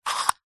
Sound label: battery tester plastic slider slide